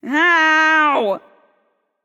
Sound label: NV Ouch; smite; awilix